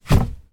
car arm on arm rest; transportation; sound effects; sound effects one

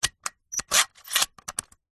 office staple gun mechanism clicks open; zapsplat

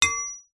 impact metal rod

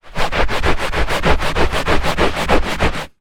household carpet scrub clean rag; zapsplat

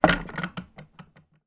household table lamp place down on table

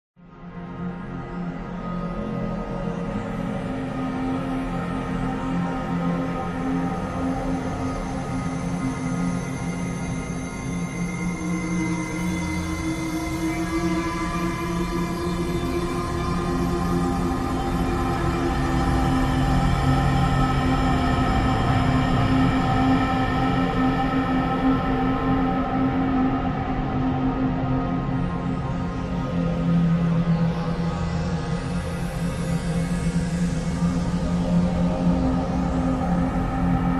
soundscape mysterious dark airy drone

